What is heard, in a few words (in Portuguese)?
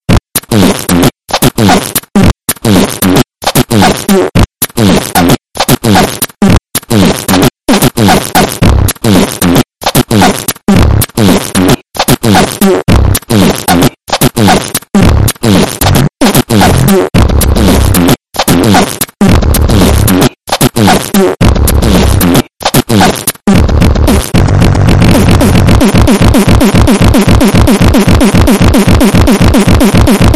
peido hip hop